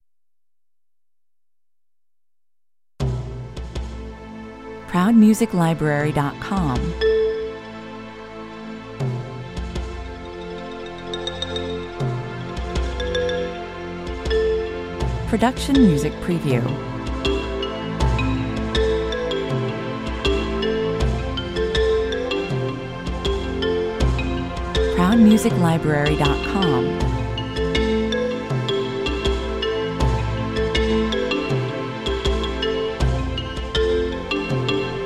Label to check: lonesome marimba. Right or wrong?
Right